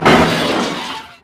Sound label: objects, World of Tanks